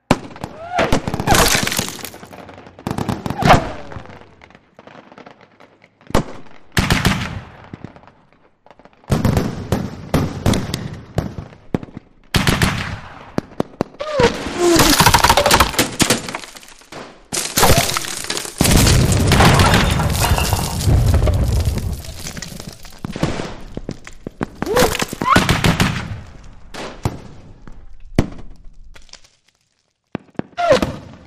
Street Battle Mixed W, modern war bg city; Street Battle With Mixed Weapons Including Small Arms, Machine Guns And Flame Thr

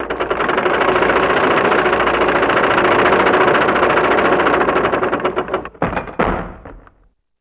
Drawbridge Let Down

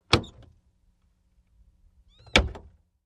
Aston Martin Trunk OC, ext. trunks; aston martin ext. trunks hoods

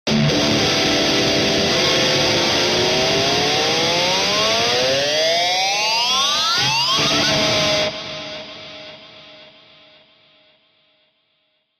Elect Guitar Scream, electric guitar

